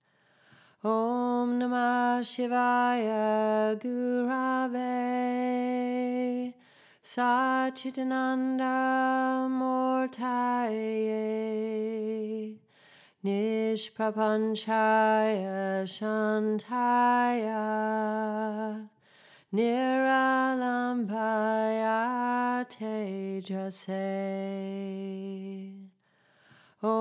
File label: Yoga Invocation Chant